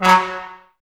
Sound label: brass, Tbs Mute; 90 s Sample CDs; Miroslav Vitous; Woodwind Brass Ensemble